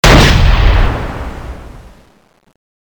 as50 shoot

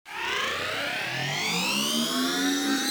grenade ready; sfgrenade; weapons

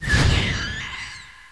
throw, zombie bomb

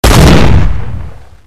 drill; sgdrill; weapons

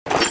pkm draw

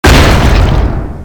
hammer hit stab

